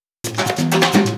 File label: Fill 128 BPM; Vengeance Samples; VEH1 Loops